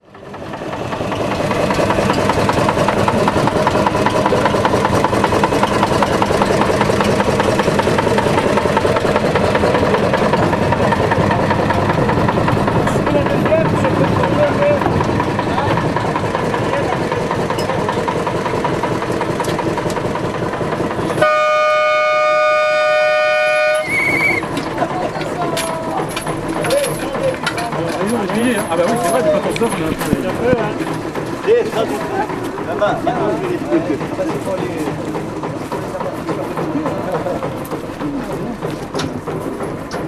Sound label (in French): Locotracteur Decauville; MZ-N710; Messeix